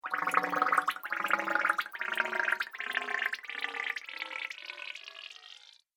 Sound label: Water Bubbling Echoes, cartoon; Cartoon Sound Design Sound Effects; Andrew Fleming